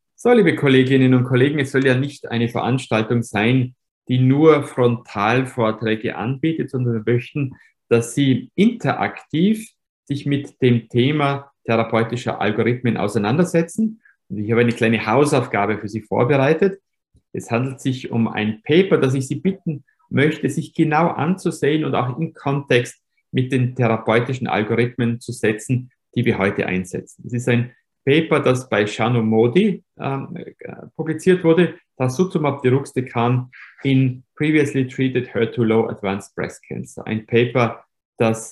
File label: singer call to action